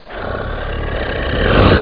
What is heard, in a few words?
ritual